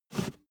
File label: step barefoot metal slide short